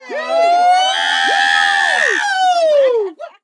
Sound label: Vocal Crescendo